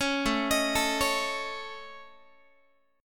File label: A Major Flat 5th A Guitar, chord; AMb5; arpeggiated